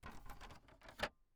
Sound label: Printer Scanner close scanner lid; Schoeps